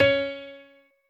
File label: piano